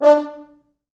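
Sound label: 4 French Horns, Horns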